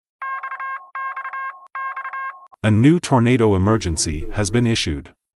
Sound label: tornado emergency; ryan hall yall